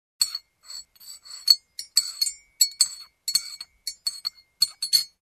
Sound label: Stiring with spoon in mug